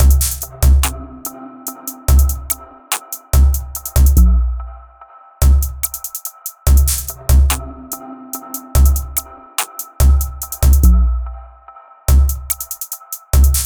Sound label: music